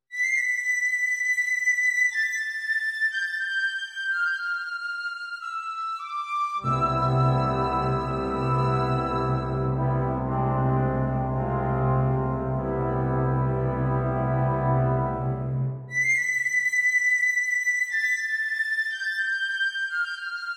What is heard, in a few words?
Ballad for Piccolo and Wind Band, Harmonie